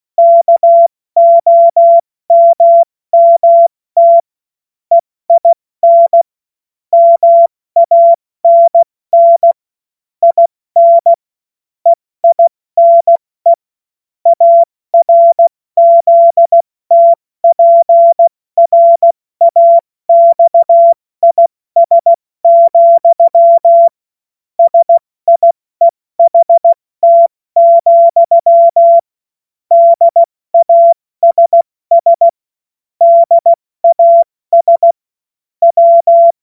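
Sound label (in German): Witz, Morsekurs, cw morsen; Witze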